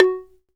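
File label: Bamboo F#2; Shinachiku; CMI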